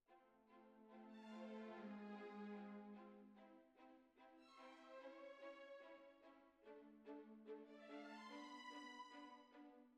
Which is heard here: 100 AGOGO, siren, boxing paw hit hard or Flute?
Flute